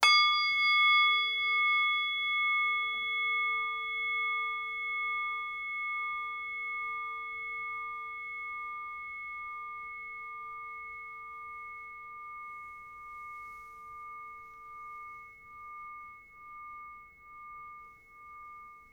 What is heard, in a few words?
Kin; Instrumentensammlung